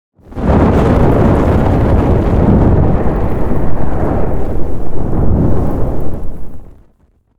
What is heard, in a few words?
FIREBALL BIG Fire sound effects